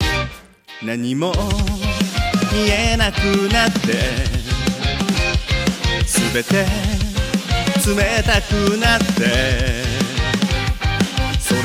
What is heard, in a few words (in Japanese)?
sunoAI arranged オリジナルカラオケ